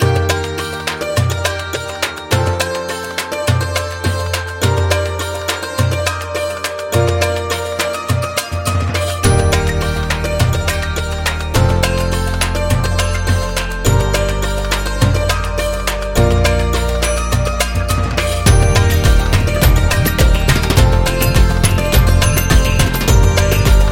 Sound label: Indian Background Music; sounds of the world